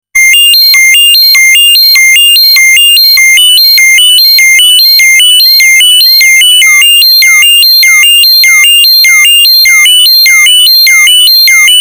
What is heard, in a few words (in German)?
Semi Modular VCOs und Resonanz sauber in hohen Lagen